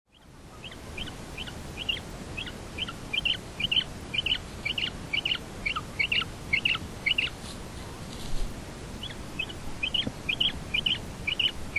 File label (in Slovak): prepelica japonska